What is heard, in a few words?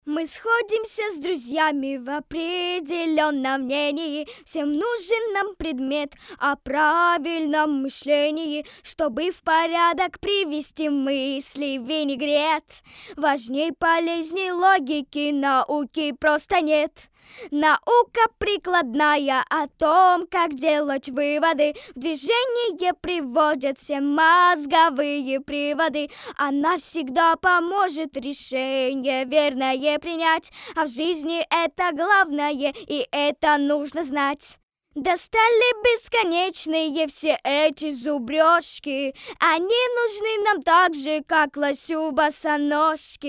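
vocal; Logika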